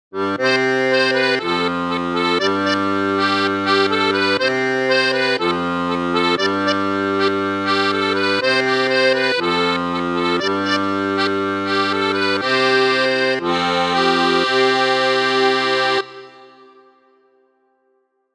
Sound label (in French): accordeon; un elephant qui se balancait